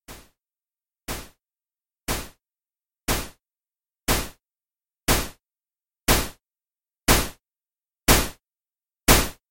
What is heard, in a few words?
Volume Taper linear